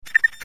DTR Talk Permit

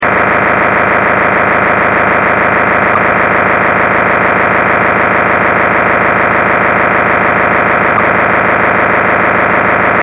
Harris IP P25 Control

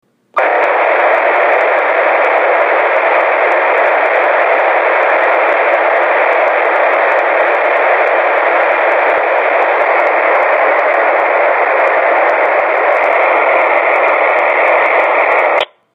VSELP RAW Simplex

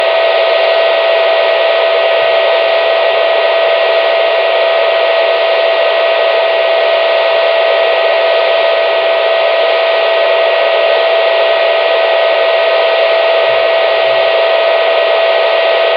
Analog Encryption

